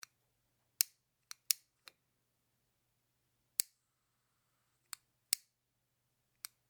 Sturmgasfeuerzeug Versuch

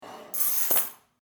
Schluesselbund

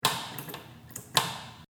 WC Seifenspender

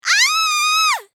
schrei weiblich

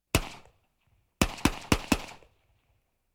Urwald Mehrere Gewehrschuesse M14

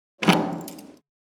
Tuer Schluessel abziehen

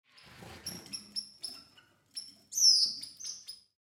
Voegel

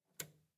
Schalten

